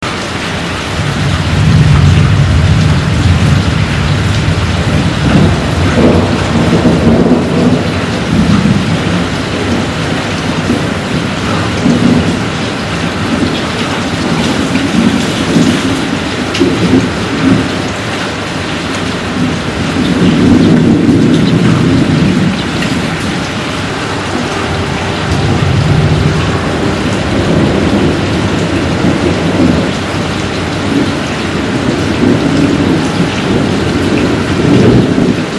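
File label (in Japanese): raiu, thunderstorm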